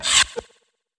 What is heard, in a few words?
death